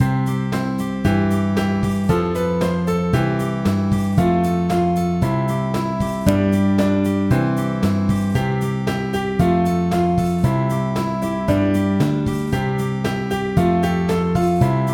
Baa Baa Black Sheep, keyboard

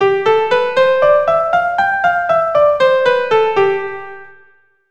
C Major Mixolydian, keyboard

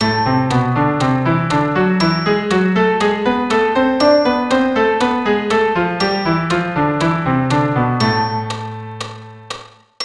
Am harmonic

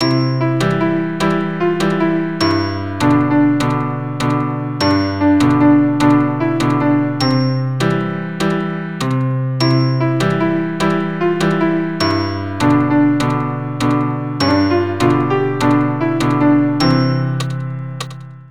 Triplet Tune, keyboard

